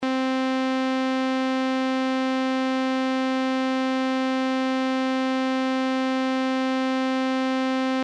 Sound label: ADSR; synthese sonore les enveloppes